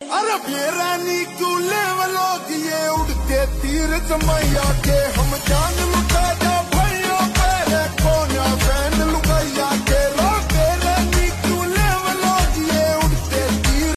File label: slowed reverb ringtone